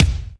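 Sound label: bass drum; AKAI S6000 CD-ROM - Volume 1; DRUM RYTHM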